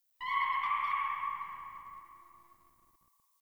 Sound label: Tree Distant Critters Happy Calm, Ambience